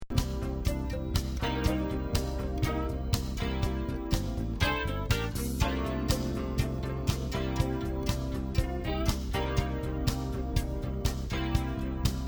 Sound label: Slow Jazz